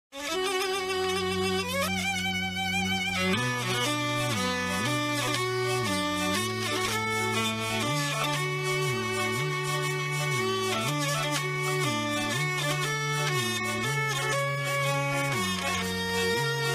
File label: Hurdy; Ride of the Valkyries